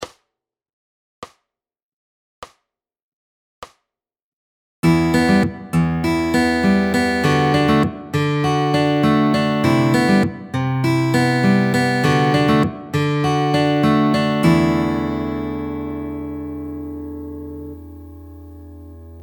Fingerpicking Pattern All Chords; Fingerpicking Fun

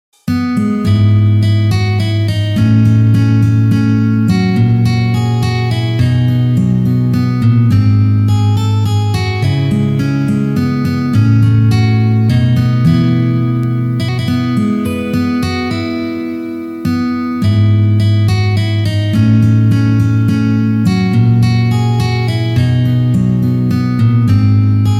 We Wish You A Merry Christmas Hard Normal

